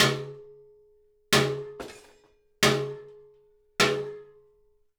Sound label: Hitting, Workshops